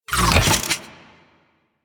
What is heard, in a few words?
Plasmor Un Equip, Shotgun; Warframe; Weapons; Corpus; Arca Plasmor